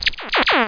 GOT EXTRA LIFE; Escape!

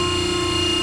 lift loop; mlift1loop; 22,050 sample rate; Doors; UNREAL

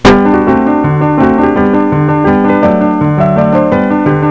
minuet

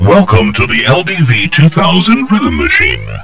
drums; Leonardo The Inventor (Broderbund)(Riverdeep)(2004)

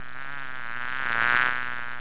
BEE; Master Clips Email Animator

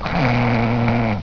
snor; homer; Doom

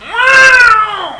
cat; Little Red Riding Hood; Koch; Storybook

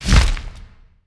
wings

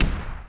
click

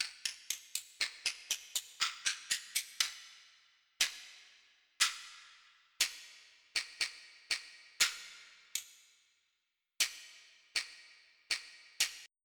Mexican Shaker

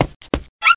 JUMP

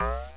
jump

